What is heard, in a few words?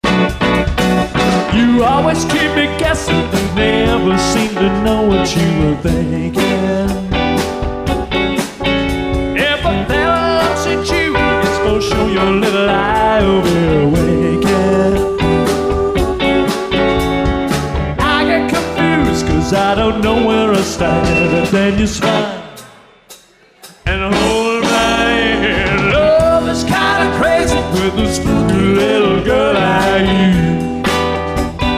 Spooky